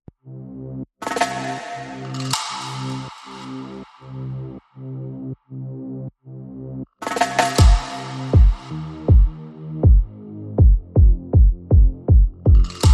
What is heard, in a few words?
Bass Perc and FX Only